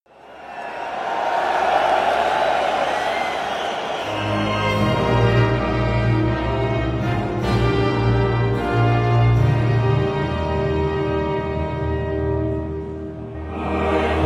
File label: Wales National Anthem; EURO 2016